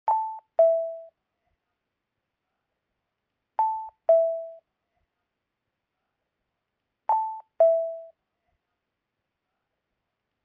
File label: ring; silverphone